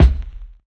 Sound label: vinyl kik; Kicks